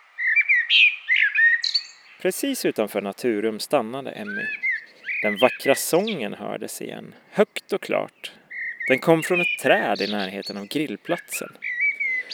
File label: turdus, solskenssang